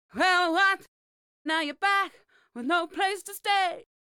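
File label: Vocal Dry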